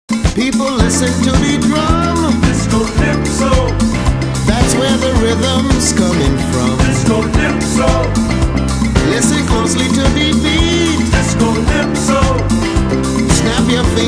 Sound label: Discolypso